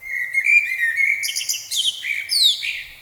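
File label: ambience bird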